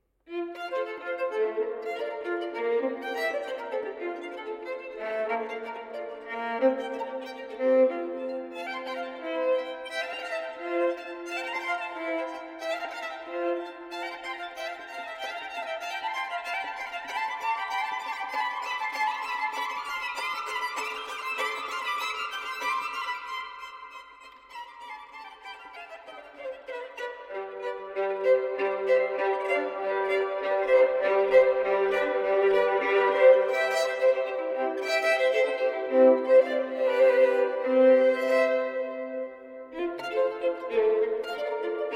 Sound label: sonata in F major allegro, suonate a 2 violini; RV 68; Vivaldi; da camera da suonarsi anche senza il basso